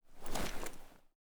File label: Armor On